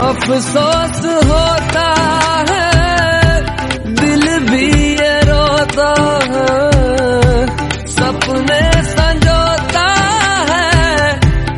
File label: lovely song tone ringtone, Bollywood